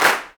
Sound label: CLAPS, Africa Handclaps